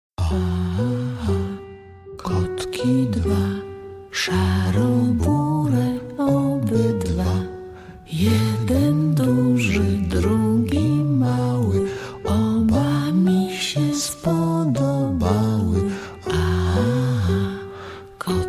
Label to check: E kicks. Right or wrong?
Wrong